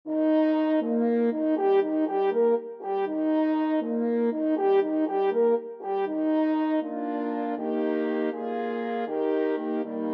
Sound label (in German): Die reiterliche Jagdfanfare